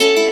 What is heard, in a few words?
guitar